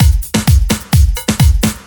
Latin Loop 128 BPM

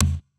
Fx Vox; Equipped Music; Loungin House

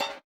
BUZZER; Spectrasonics; Metamorphosis

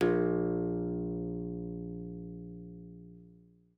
A#1, GUITAR; Xsample VOL-2

